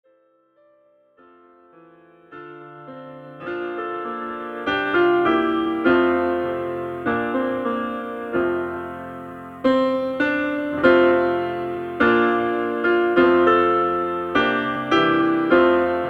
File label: PIANO; gospel